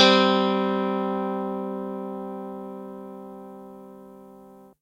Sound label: steel guitar; instruments; lmms